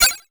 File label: Blip, 8-Bit